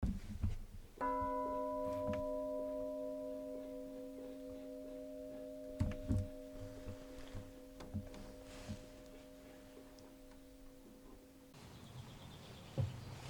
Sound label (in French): cloche; St Etienne de Gourgas